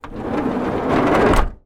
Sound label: renault master camper van 1982 door slide closed